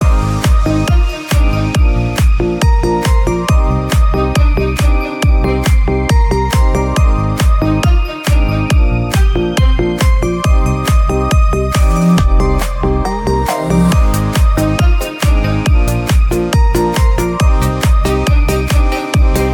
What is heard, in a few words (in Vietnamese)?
remix khong loi piano